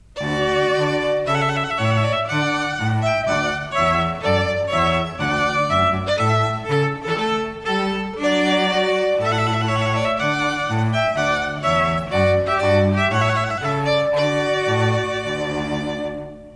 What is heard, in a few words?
Trio Trumpet Voluntary